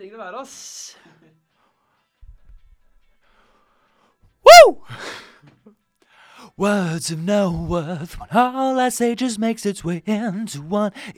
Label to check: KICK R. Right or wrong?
Wrong